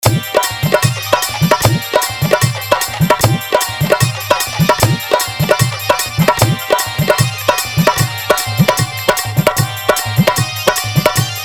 Jhan Jhan Bass Hard Bass Toing Mix

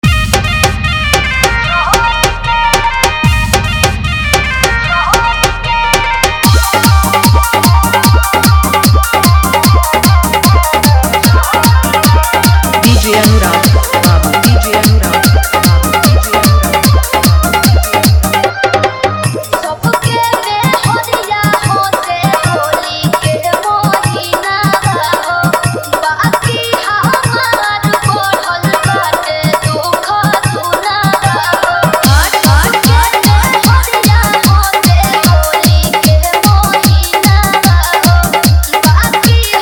Bhojpuri Holi Dance Mix

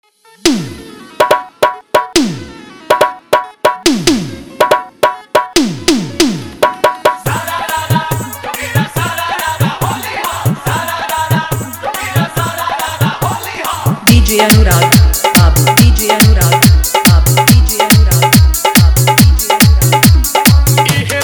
Full Dhollki Hard Mix

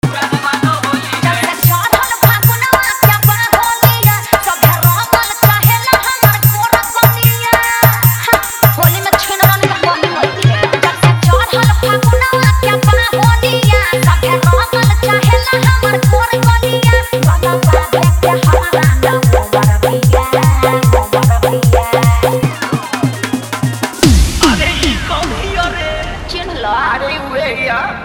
Bhojpuri Holi Jhankar Dhollki Bass

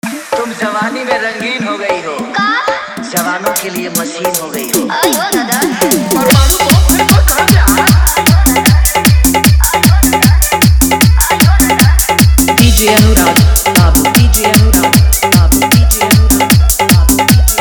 Full Dhollki Bass Remix

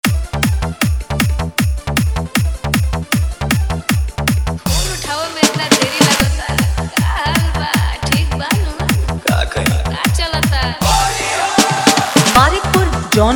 Full Dhollki Bass Dance Mix

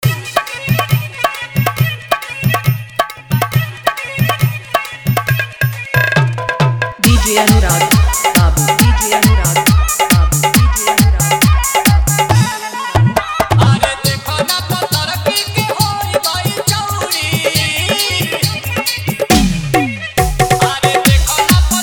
Hard Dhollki Mix

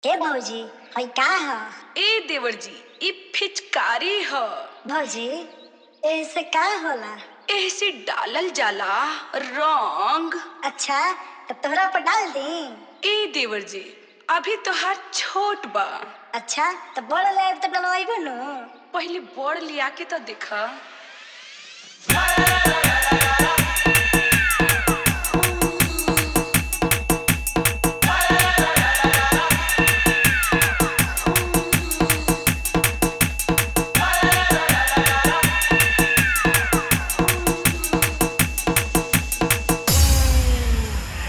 Full Dhollki Bass Dance; Rod Show

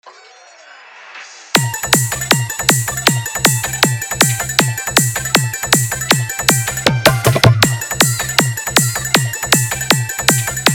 Old Song Mix Dhollki Dance Mix

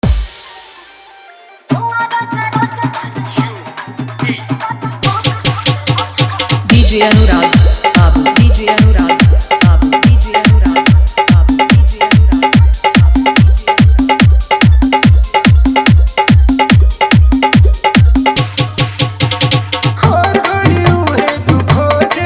New Bhojpuri Trend Dhollki Bass Mix

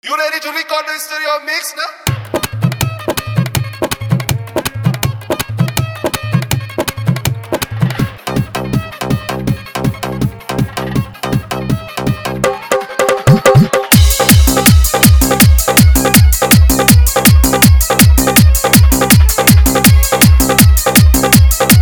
Full Dhollki Bass Mix

